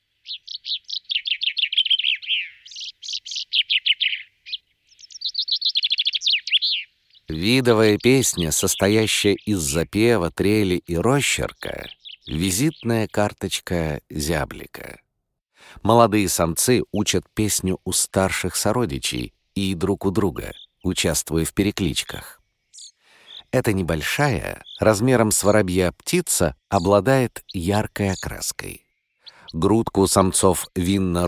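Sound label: chaffinch; zyablik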